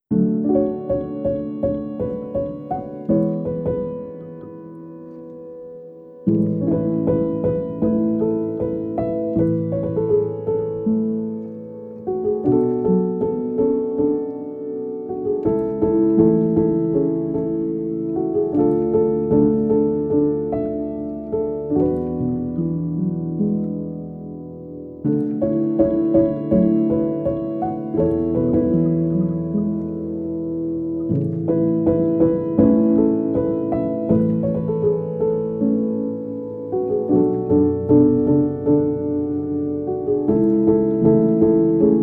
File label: sleep piano; Spirited Away